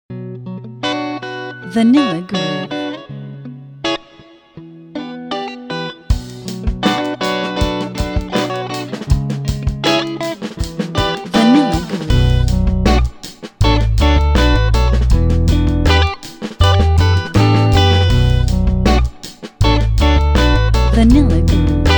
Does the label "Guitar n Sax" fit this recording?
Yes